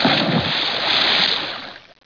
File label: ka splash